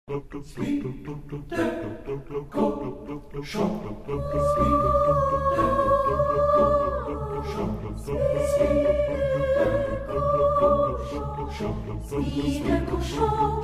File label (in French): cochon chorale